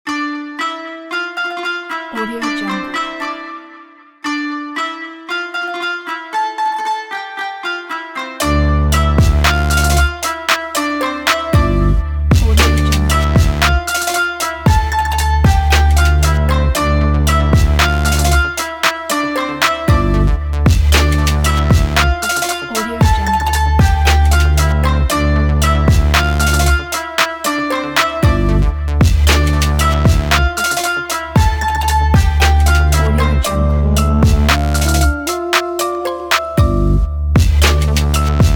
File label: ethnic oriental hip hop